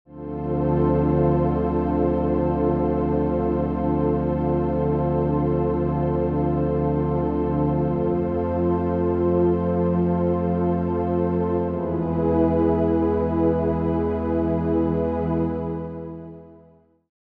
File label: wavetable pad; ableton live tutorial